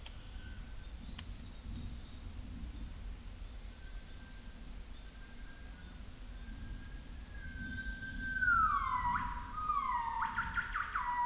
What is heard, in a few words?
Nomascus gabriellae Male